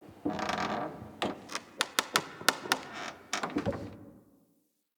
Station Misc, Ambience; Soul Plane; Orlick

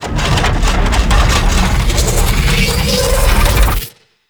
Comet Pellet Destroy Buildup B; Gameplay; Warframe; Corpus Railjack; Comet Shredder

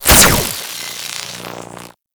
Nervo Fire; Warframe; Captain Vor

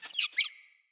Floppet Double Squeak, Animals, Vocals

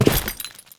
Step Default, Footsteps; Alad V

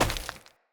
Walk Default, Footsteps; Friendly; Avakot

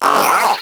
Vocal Pain, Pets; Warframe; Characters; Zanuka

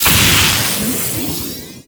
Lightning Rod Strike; Ambience; Gameplay; Warframe; Corpus Gas City; Gameplay Remaster

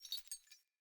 Grunt Foley Movement Chains; Sinecure; Melee Grunt

